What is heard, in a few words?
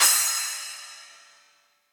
Splash 8 Deep Snare Dynamics, Cymbal; Drums; Drum Hits; Standard Hits; Ableton; Factory Packs; Session Drums Club